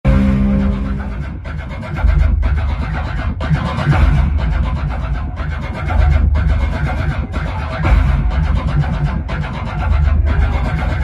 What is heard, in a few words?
bgm mobile ringtone; marco movie